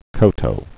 koto

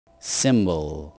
cymbal

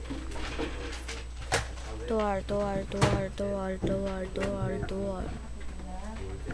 door

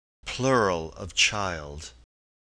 children

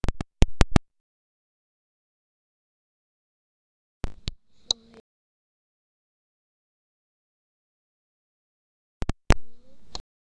fire

